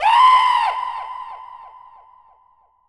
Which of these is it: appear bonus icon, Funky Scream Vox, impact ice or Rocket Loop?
Funky Scream Vox